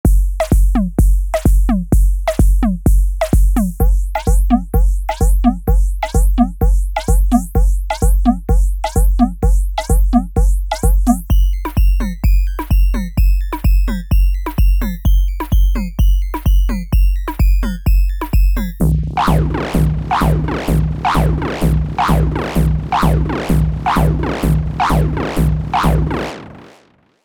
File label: Electro House; Molecule